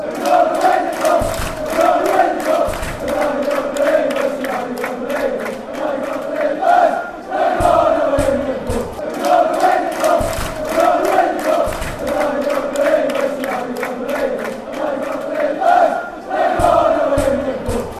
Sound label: were gonna win cup, fan chants